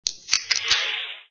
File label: can open